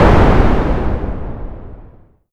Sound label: explosion